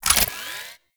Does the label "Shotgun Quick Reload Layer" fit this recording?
Yes